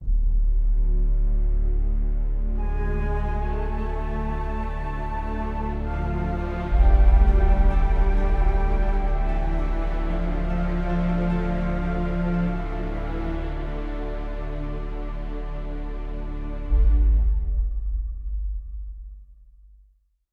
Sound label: mus recall; Smite; thor gladiator